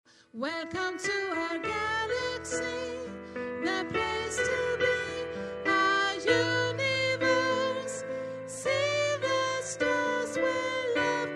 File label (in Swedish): sopran